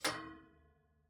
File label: Exterior Metal Door Gate Bolt Latch; 344 Audio; Practical Doors